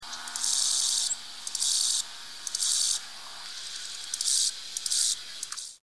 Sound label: acridid